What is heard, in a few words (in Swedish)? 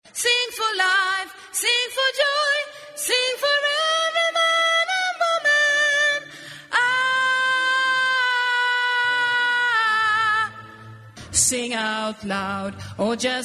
bas, stammor